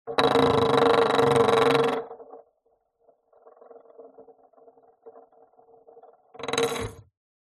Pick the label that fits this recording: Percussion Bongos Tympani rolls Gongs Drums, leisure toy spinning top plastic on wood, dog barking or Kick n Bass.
leisure toy spinning top plastic on wood